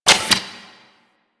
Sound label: Fist Movement; Enemies; DE; Warframe; Tyl Regor; Fists